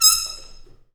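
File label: brass trumpet mute stab, Harmon stabs; Harmon Amb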